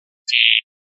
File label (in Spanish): rep ranadas, pardillo